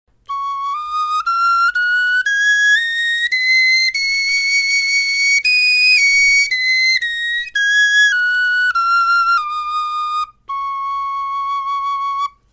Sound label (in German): tonleiter, knochenfloeten